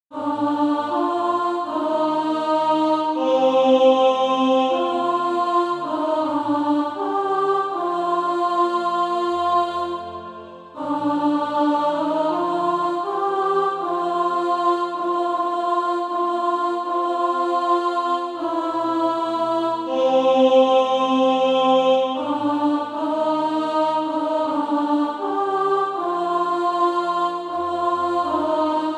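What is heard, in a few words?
alto; SATB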